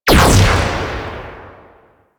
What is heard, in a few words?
Shotgun Shot; Crp Shotgun